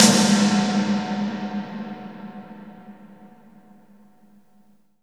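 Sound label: snare processed, Disco House; USB Soundscan vol.20; Fresh Disco House I; AKAI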